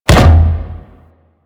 Pilot Breakout Hit One; Warframe; Amalgam Carrus

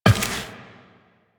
Step Glass, Footsteps Walk; DE; Warframe; Tenno

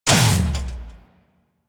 Mech Run Stop; Nechro Mech; Vehicles

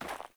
Sandals Run Snow, Footsteps

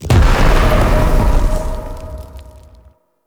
Drunken Flame Pillar Aoe Burst; Characters; Enemy; Abilities; Circade

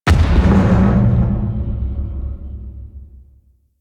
Ship Damage Explosion; Warframe; DE; Vehicles; RailJack; RailJackHitEffects

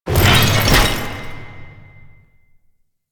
Customization UI Equip; Veilbreaker; Kahl

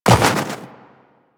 Run Snow, Footsteps; DE; Warframe; Tenno

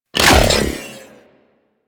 Deployable Cover Destroy; Corpus

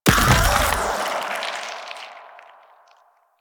Molt Cast; Warframe; Warframes; Saryn

